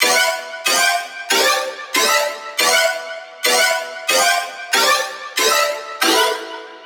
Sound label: Riddim Synth Loop E (140); Pout; Ghosthack; Riddim Essentials 2020